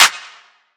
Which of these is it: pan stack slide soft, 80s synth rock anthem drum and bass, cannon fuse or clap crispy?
clap crispy